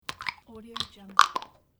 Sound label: dentures false teeth; soundark